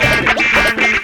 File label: Skratch & Gtr 115, Lounge Breakz